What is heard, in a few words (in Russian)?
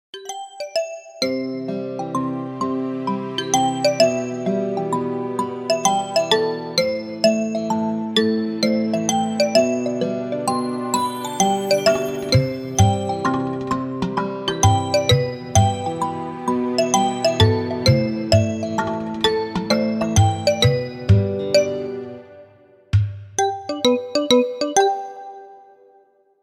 muzyka dlya budilnika